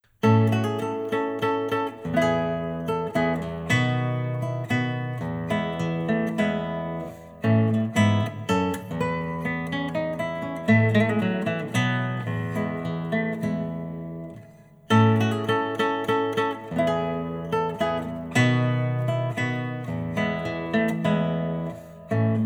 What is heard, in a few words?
Galliard, classical guitar; Round Battle